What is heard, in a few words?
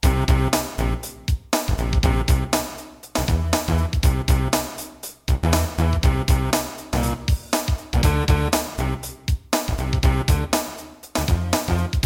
Rap